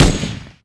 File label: machine gun; weapons